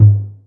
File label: Tom Lo Wide, 808 Toms; Slate; Vintage Drum Machines Volume 2 808 Edition